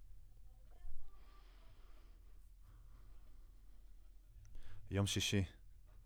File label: Voc OD